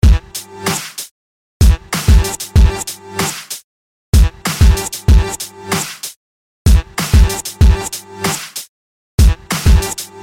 hiphop sms

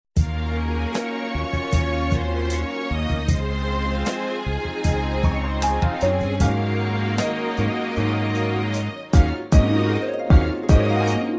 lovely ringtone

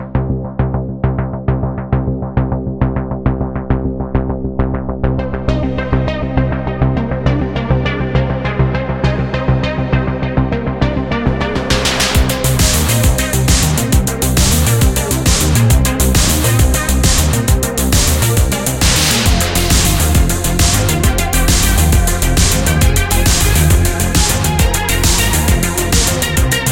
new super sound

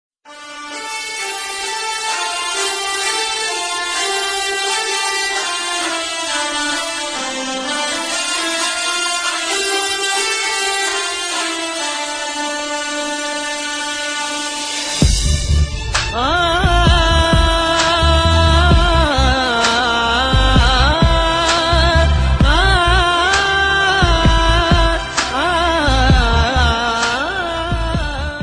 title tune

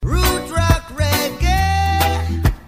ericsson tone; ringtones; sms

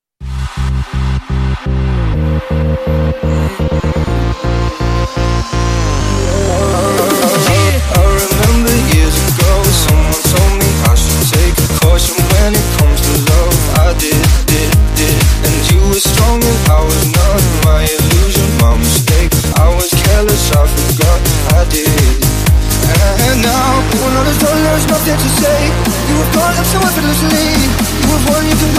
Dubstep Dance Pop

